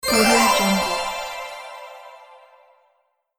spell defense bard

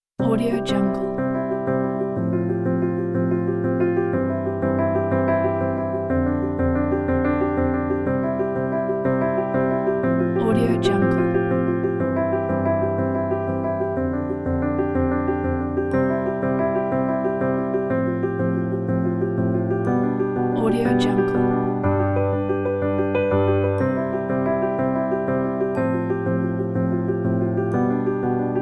simple piano ad

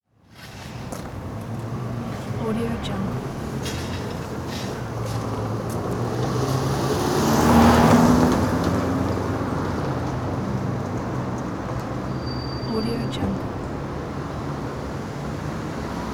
sporadic single cars driving by city night

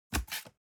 object box cardboard close fold scrape short

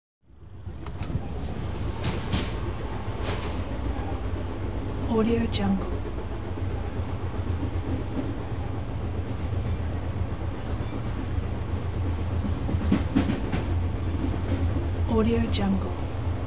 amtrak train between two cars

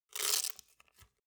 object household velcro undo roomy short

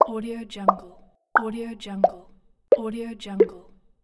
simple pops

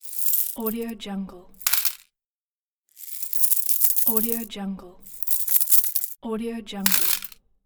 coins

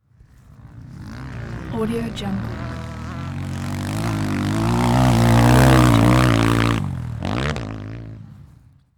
passing by motocross motorcycle

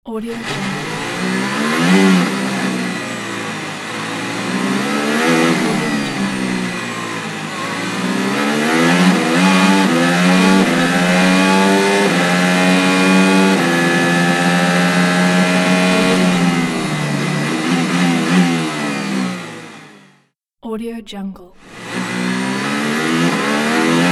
racing engine sound pack; djsapa

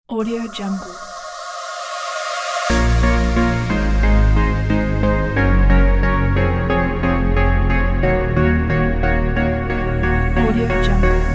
future bass; travel

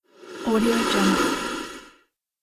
whoosh swoosh

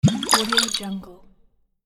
bottle

